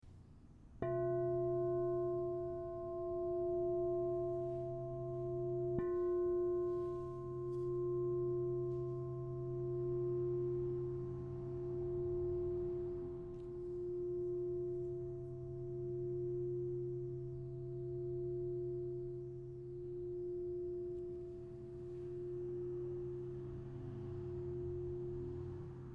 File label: Crystal Tones Singing Bowls